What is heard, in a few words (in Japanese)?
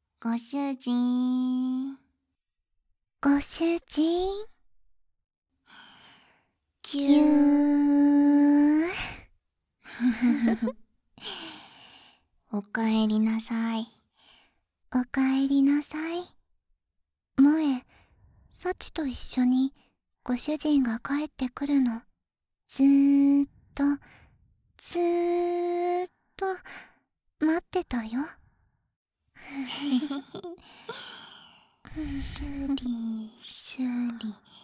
ウエルカム指耳かき・お耳ふーふー, ASMR; いたずら猫ちゃんのあまあま旅館